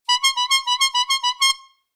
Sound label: clown horn, honk honk honk honk